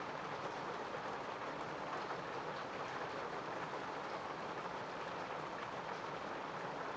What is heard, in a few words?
Ruins Rain Indoor Loop; gamerip